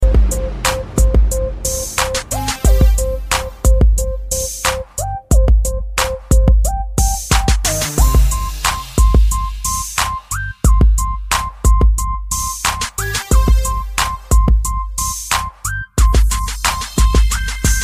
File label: Nice Beats